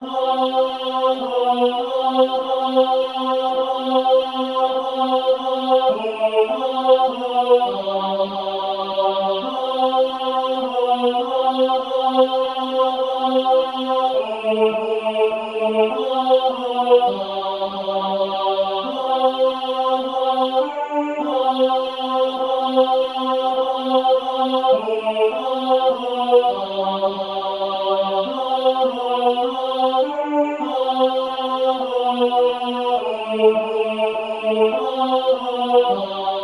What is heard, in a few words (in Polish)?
Tenor na tle SATB; Kto sie w opieke